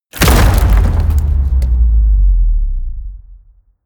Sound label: hit wood, hits impacts